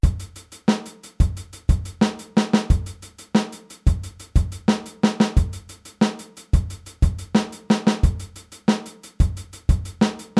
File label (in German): Groove 16tel; Groove Nr. 2a; Aufteilung linke und rechte Hand auf HiHat und Snare